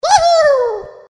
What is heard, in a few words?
yeehooo